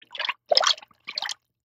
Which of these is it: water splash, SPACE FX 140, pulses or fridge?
water splash